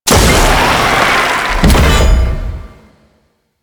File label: Loot Crate Medium; Warframe; Entrati